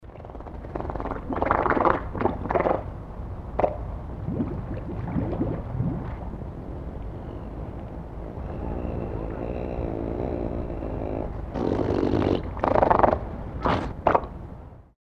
Seehund Lautfolgen und Blubbern beim Abtauchen

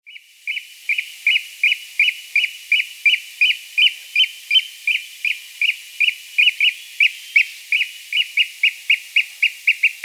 Austernfischer zwei Tiere Rufe beim Abflug

